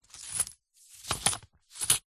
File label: foley money coins movement in paper envelope